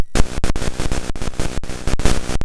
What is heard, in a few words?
MOS6581R3, sid